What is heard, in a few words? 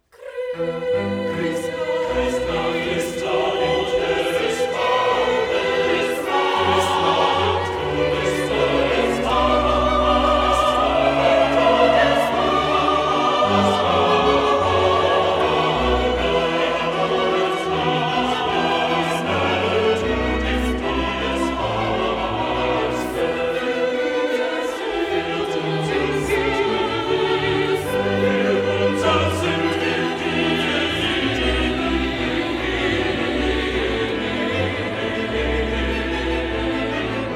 alto excerpt; BWV 4